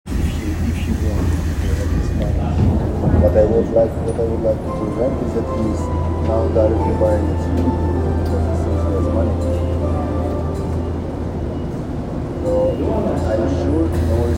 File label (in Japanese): 東西線早稲田西, train sounds